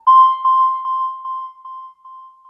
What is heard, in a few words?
Low Energy